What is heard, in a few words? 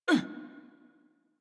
Generic Short Pain Operator Voice